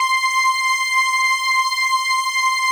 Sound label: PAD, DIGI-PADS